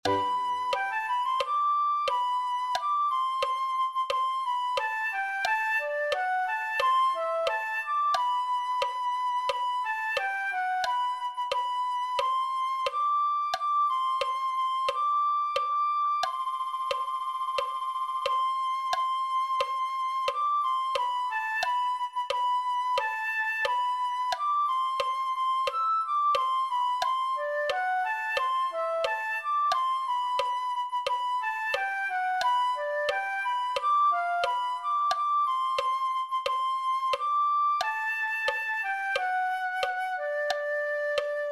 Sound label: flute